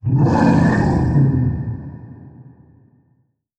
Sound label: monster; MonsterVoiceお手軽パック